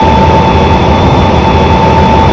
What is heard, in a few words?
turbine; A350